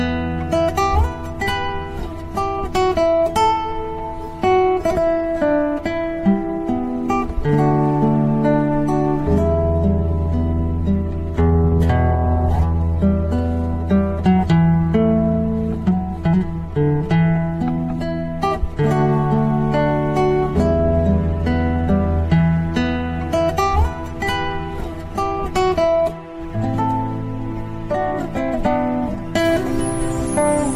Guitar

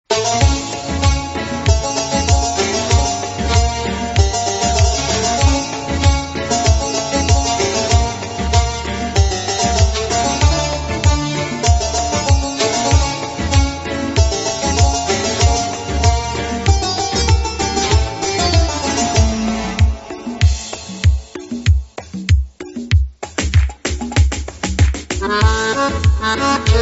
Accordion

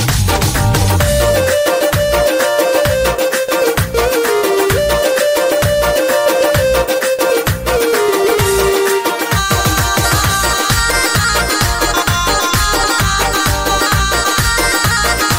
Melody

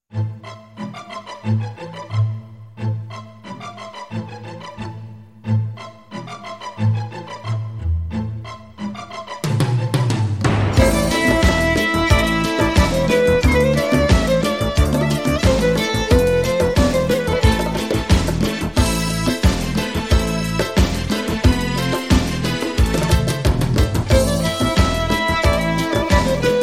Violin

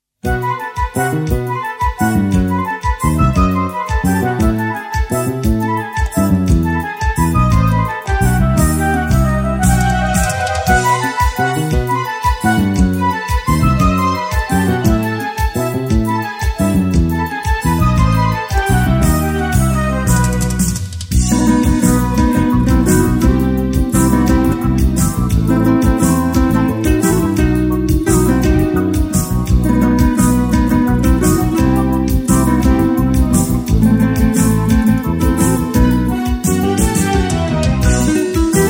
Guitar

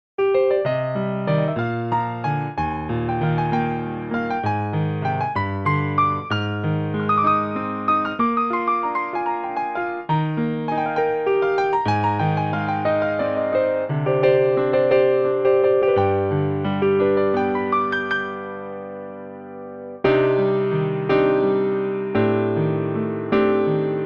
Piano

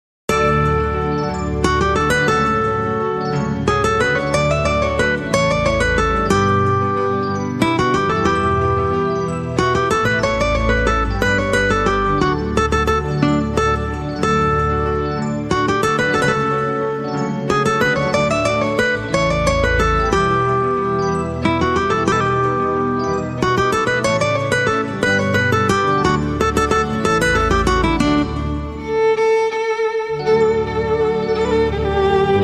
Violin

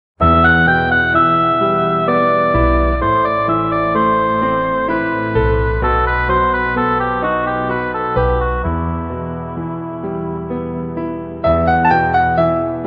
Violin

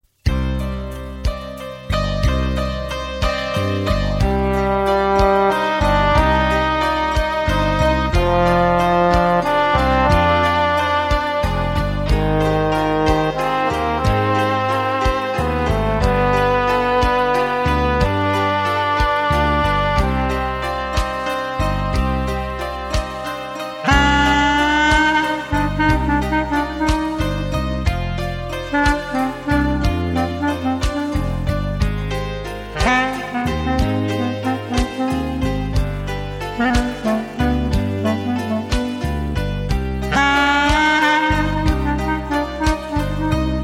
Saxophon

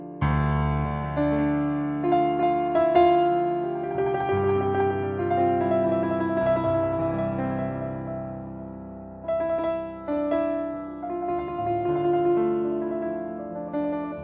Piano

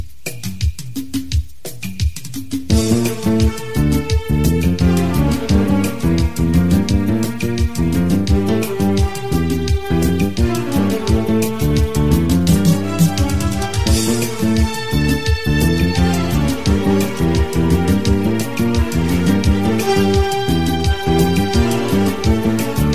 Guitar

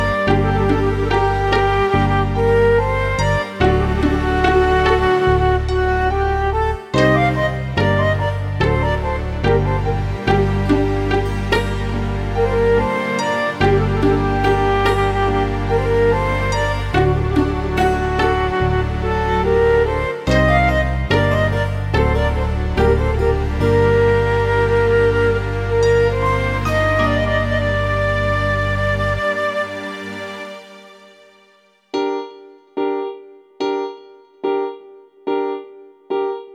Trumpet

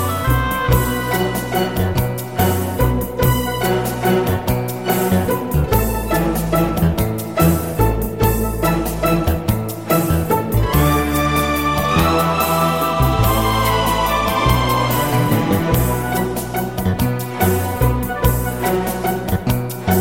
Flute

